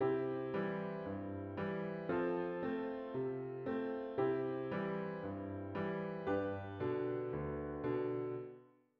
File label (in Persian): piano chargah